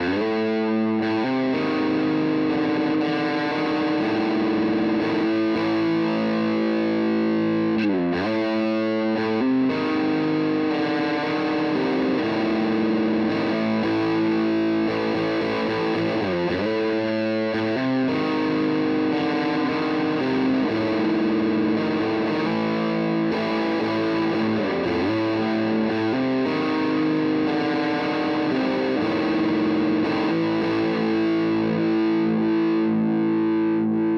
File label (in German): MH 1000 Zerre; ESP LTD Deluxe